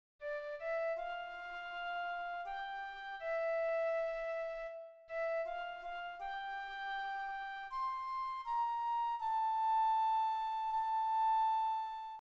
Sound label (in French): FLUTE; Garde Moi Mon Dieu